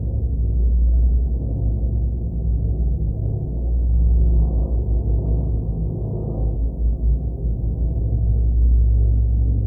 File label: Main Room Absence Loop; Warframe; Apostasy